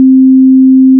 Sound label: beep 262hz sound effect; Generated 262Hz beep tone sound effect 1 second duration